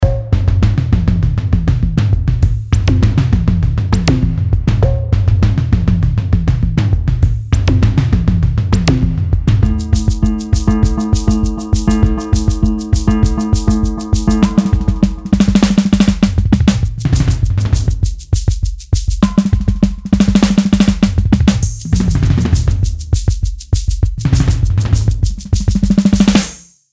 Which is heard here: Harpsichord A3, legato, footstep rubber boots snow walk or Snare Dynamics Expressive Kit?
Snare Dynamics Expressive Kit